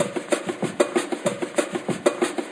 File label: 190 bpm brazil hipass percussion, DRUM LOOPS and BREAKS